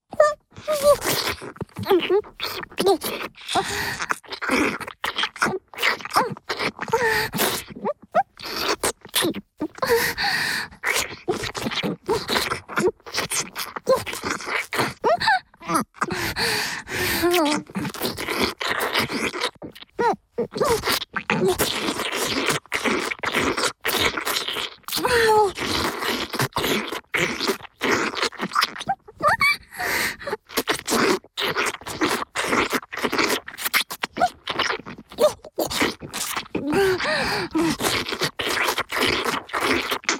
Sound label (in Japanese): イラマチオ(中):頭を掴まれ腰を振られる, チュパ音; チュパ音抜粋集